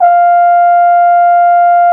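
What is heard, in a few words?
French horn C3; Greytsounds VOL-1; MONO BRASS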